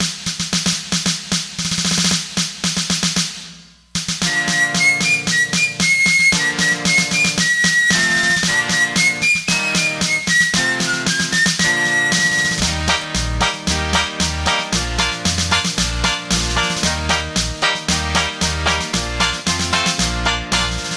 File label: yankee doodle ringtone